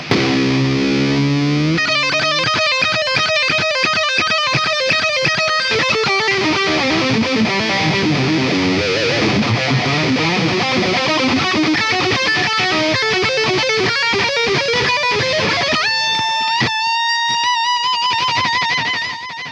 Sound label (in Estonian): kitarr solo